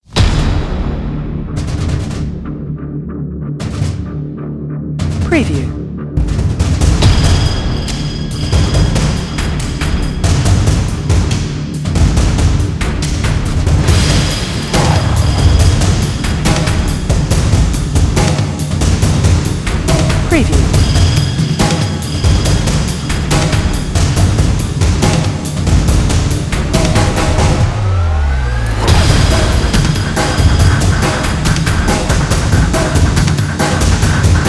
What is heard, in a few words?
Classical Action Drums Fighting Chasing Running Scene Soundtrack